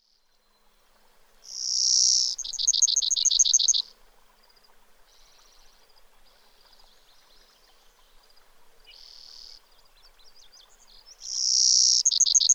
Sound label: Brewer's Sparrow